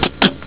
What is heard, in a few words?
tick